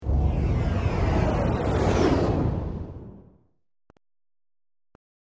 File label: warp out